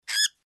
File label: cockatiel